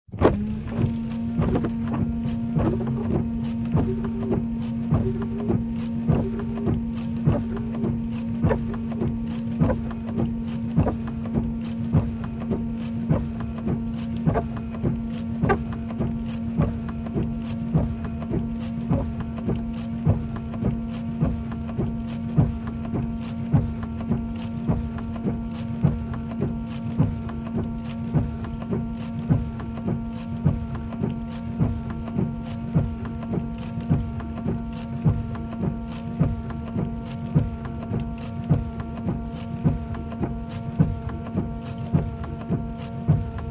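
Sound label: Car Interior Windshield Wipers Fluid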